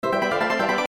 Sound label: lvup; End of Aspiration; android